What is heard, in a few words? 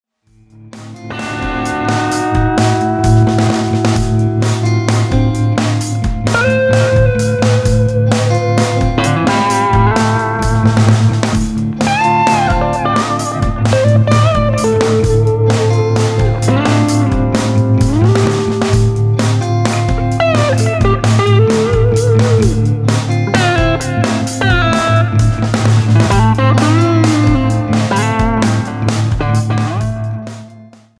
Fender Clean